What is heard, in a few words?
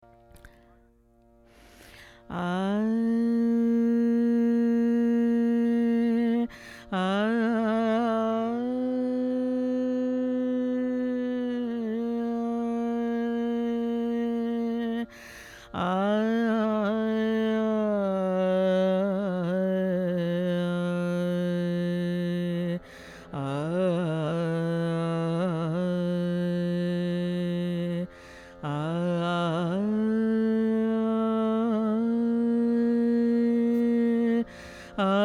sri vocal; sri raag